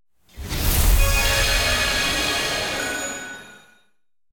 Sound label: random Symbol Transform; fairyhansel